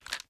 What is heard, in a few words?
reload xmag start; gmod